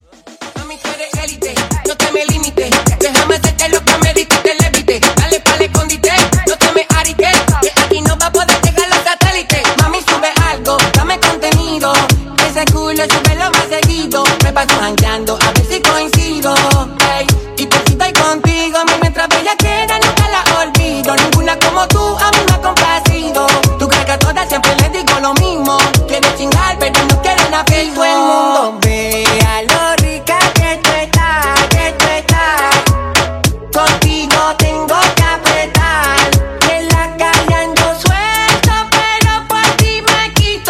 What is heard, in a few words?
Reggaeton; Top40